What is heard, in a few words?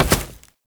Step Vegetation, Foley; Warframe; Oraxia